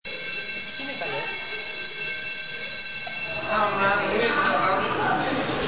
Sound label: school bell